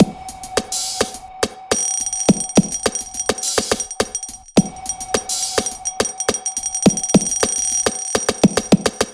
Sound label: HipHop Mood